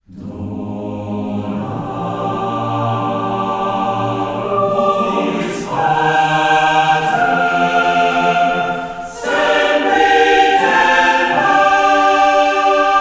Choir Epic Long; The Dwarves 2016 ps4 gamerip

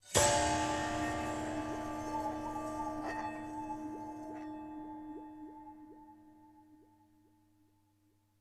Conversation Stinger; Storytime Zoe Zoe

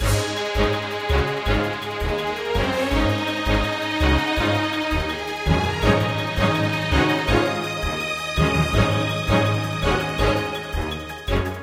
pre battle music; EvoCreo; Android game music

